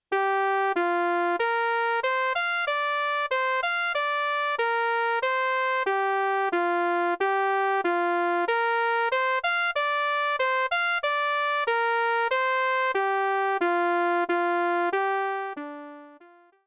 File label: trumpet